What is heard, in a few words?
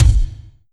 Yamaha RM50 Gated Kick